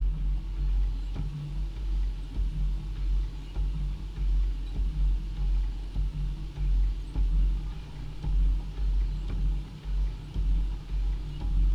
Print Shop Machine Rhythm Resonance Sub Bass Deep Noise Contact Mic; The Soundcatcher